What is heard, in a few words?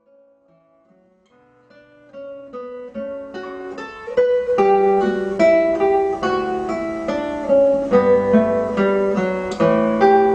Pachelbel Cannon in D solo guitar; Swing